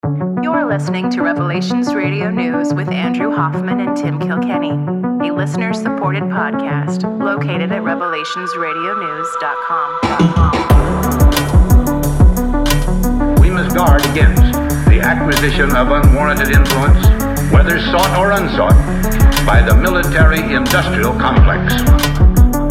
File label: Intro Music